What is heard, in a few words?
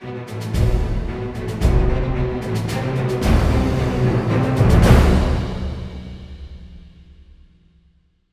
Soft Action